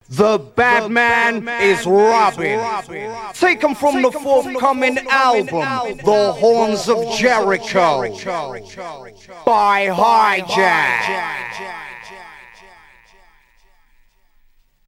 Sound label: Vocals ('the horns of Jericho')